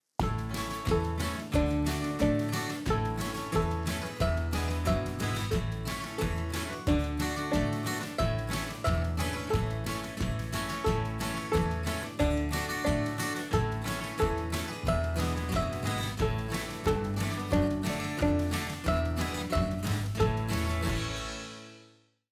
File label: Violin